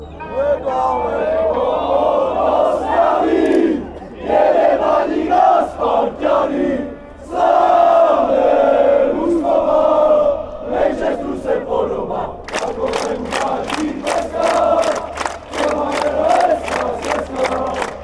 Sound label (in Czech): nedaleko od ostravy, chants